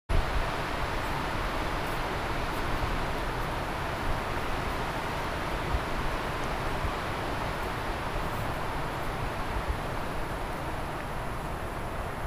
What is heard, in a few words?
Lake Wind